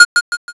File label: tap